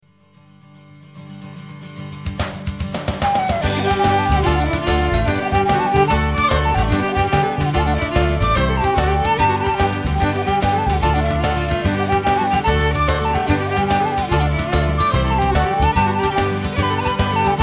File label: Irish Band; Orlando; Cook in the Kitchen